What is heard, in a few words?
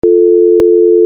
ending call, end call sound